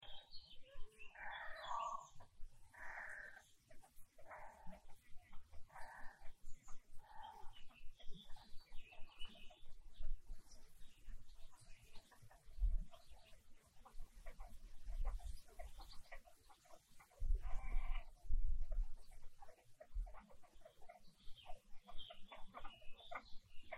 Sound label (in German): steg sound vogelstimmen naturgeraeusche; Fleesensee